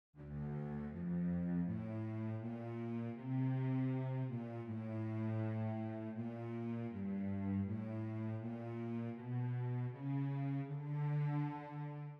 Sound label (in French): VIOLONCELLE; Garde Moi Mon Dieu